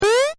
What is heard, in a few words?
jump; Wreck-It Ralph